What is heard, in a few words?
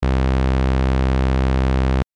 HY SAW; Brainworx